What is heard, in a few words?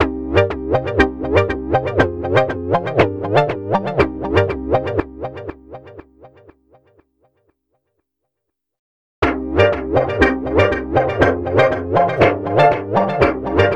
house synth